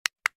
Computer On Off

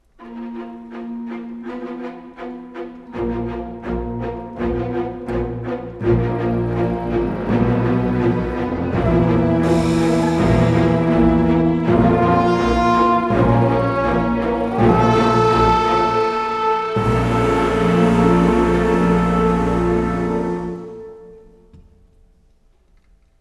Lose Long; Incredibles; gamecube

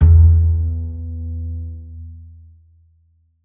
Bass Note; Bendy Lone Wolf

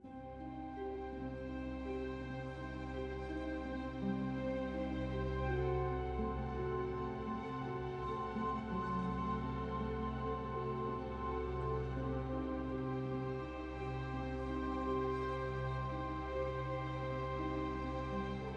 Portal Hall Loop

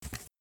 Cardboard

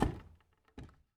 Crate Impact

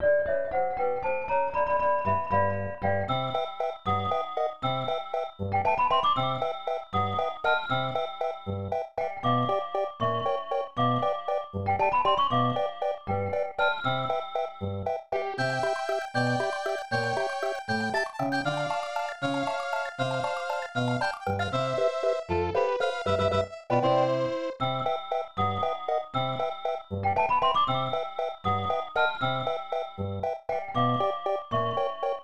Hurry Up! Underwater BGM; Super Mario All-Stars; SNES

